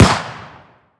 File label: close, shot shell; ACE3; Arma3; CookoffSounds